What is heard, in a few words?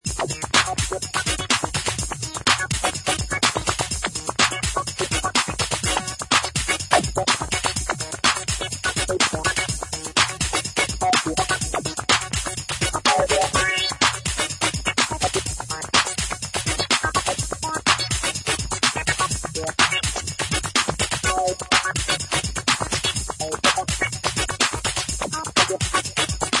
hi tech funk